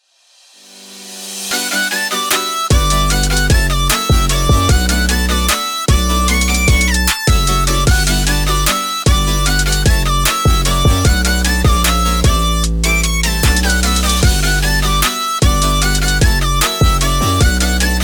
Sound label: Soft Instrumental; Friday Night Funkin Baddies official soundtrack pc 2022